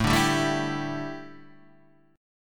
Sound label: A 7th Suspended 4th, chord